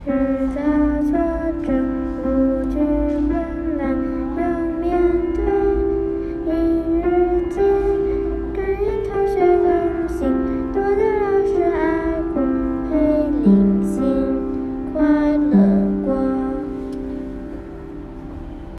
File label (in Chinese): 舊曲新詞